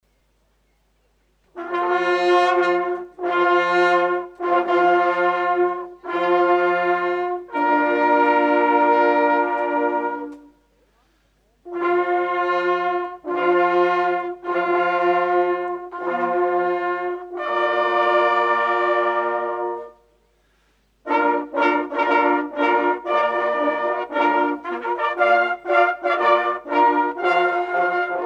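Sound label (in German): Hubertusmarsch, Jagdhornbläser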